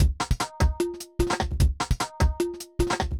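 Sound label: bangra, 150 bpm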